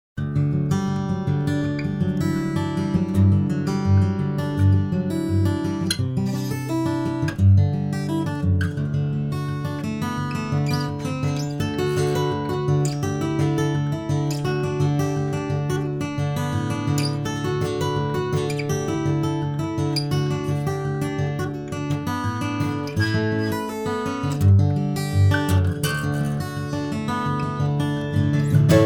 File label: Acoustic Guitar Room